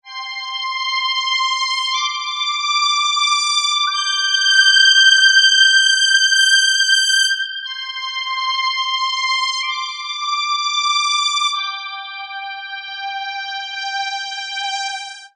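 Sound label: extra lead (spooky)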